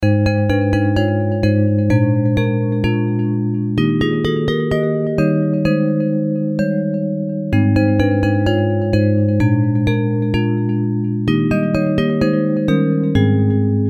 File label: Bells